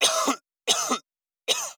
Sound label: Coughing; Ilyen egy gyógyíthatatlan beteg tüdejének hangja; AudioOwl